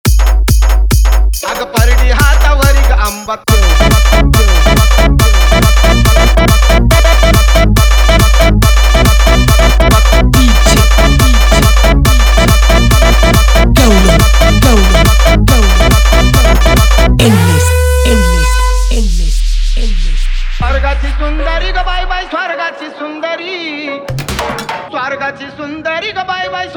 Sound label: Police Siren Edm